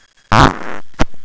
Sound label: fart